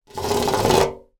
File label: household metal radiator hand run across; sound effects one